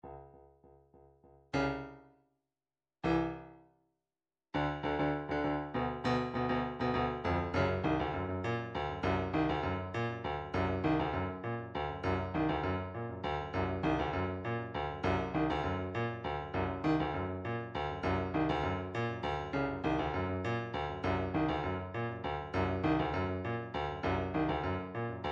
odd meters; Drumtrax Library 2

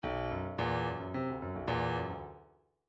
bdf midi file grooves funk s funk s

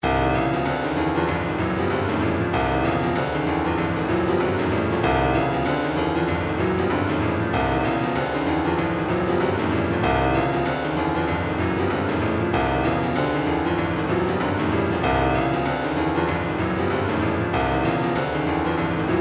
retro funk 096 old school, midi

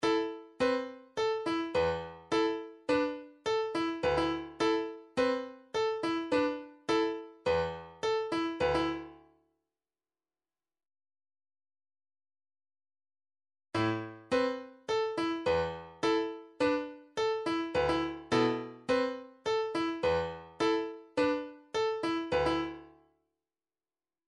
midifiles steady beat 105 bpm; rollin

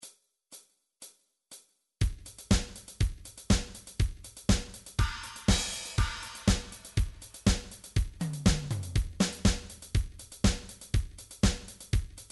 midi drums, new age

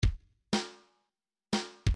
swing kick snare 1 bar, midi

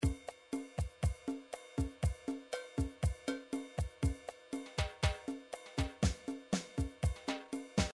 midi drums

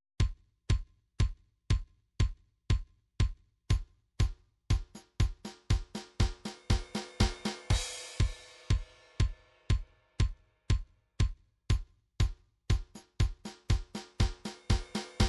drums, midi drums

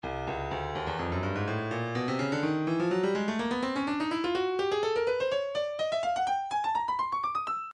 stylus breakbeat midi files 125 bpm; Cooked B

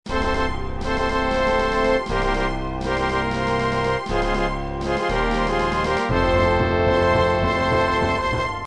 fanfare; super nintendo donkey kong country 2

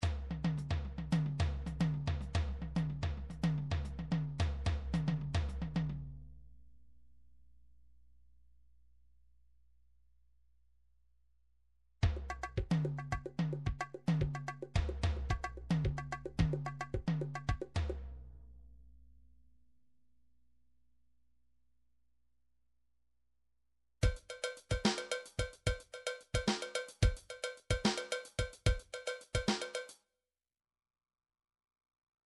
drums, midi; Bruford Midi Drums

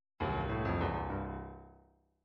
midi fills rock

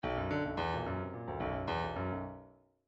bdf midi file grooves funk q funk q

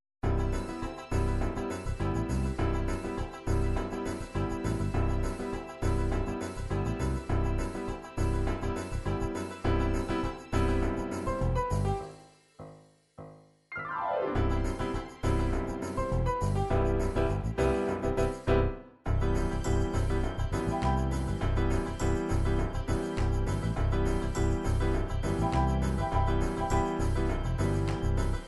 dance pop, midi

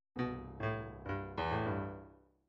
midi fills rock

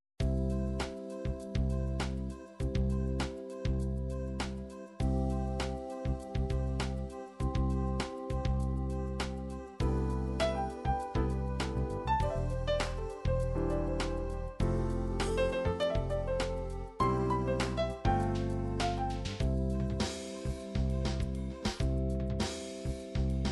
16 beat, midi

